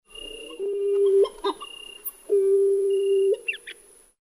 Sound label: hawaiian petrel